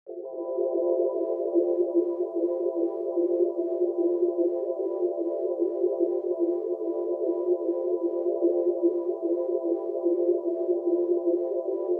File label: ambient